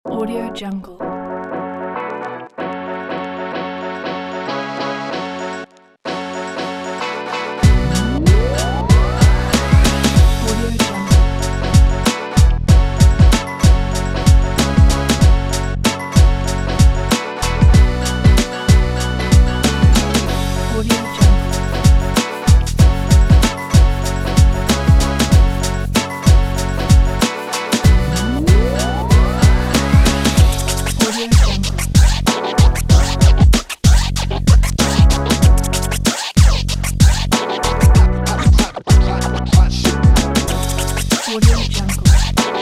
optimistic hip hop

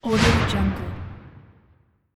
swoosh

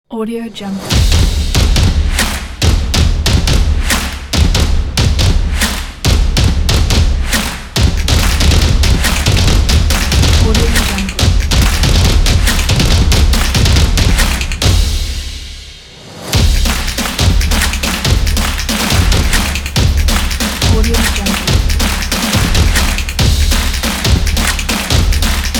the drumming

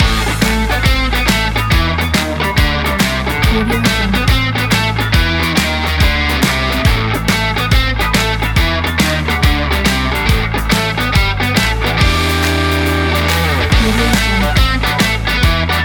energetic texas blues funk loop

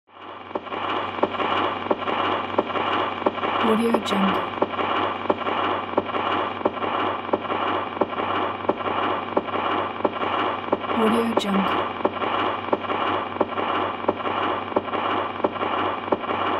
stuck record

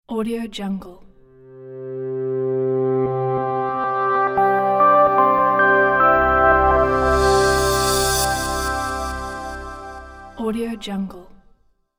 short sunrise logo

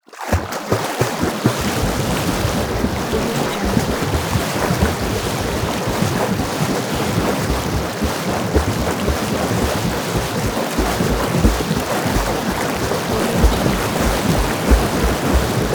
water feet swimming; soundmorph

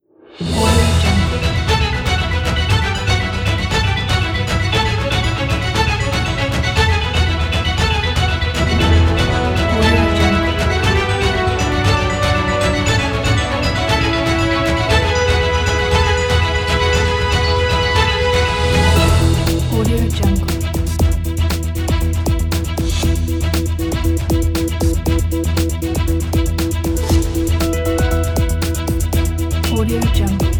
headline news

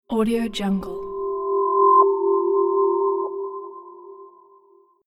resonating magic spell; sonic-boom

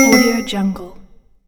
miss

